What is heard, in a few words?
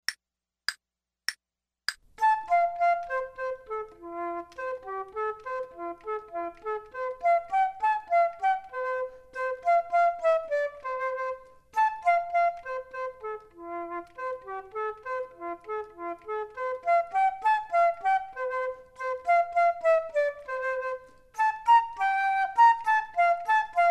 Duets flute; Selected Duets for Flute Podcast